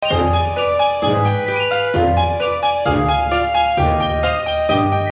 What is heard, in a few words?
Game Tune